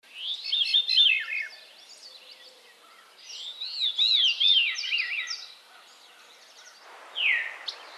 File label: VEERY, birds; species/birds; wbba